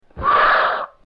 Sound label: pain, dragon white tiny